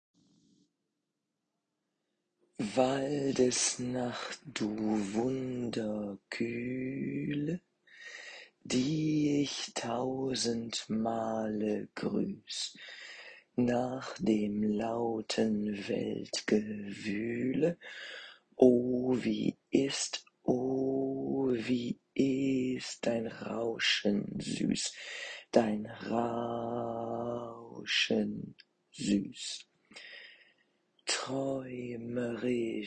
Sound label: Alto; Wald